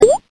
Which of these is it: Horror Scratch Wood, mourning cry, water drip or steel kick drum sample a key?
water drip